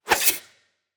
shadow assassin strike; Shelter

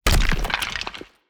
Bone Golem Death; TANK; 3Souls

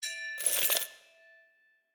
ui salary

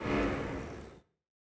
ambience room dark temple pentagram ready

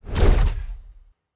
Drone Gravitrone Use; TANK; 3Souls

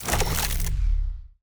Drone Gravitrone End; TANK; 3Souls

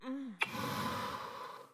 npc strike; Shelter

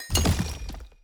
successful coridor build

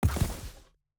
environment block digged up

